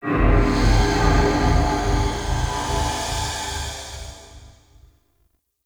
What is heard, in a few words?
Intrusive Echo; Warframe; Ambience; Entrati